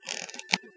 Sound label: creak